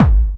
techno 2, bassdrum

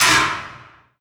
Oneshot; Sonic Foundry (Sony Creative Software); Esoterik Beatz; Annoya

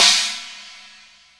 CHINA, JAZZ; THE JAZZ

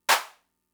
Clap, Fricke MFB-522, Drum Machines

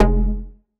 Bass F, Bass Synth

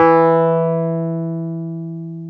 Wurly; Keyboards of The 60's and 70's

